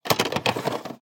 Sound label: VHS cassette takeout